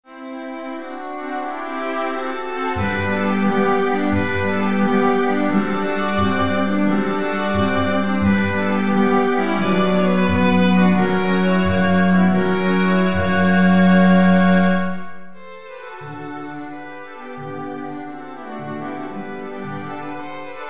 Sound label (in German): streichquintett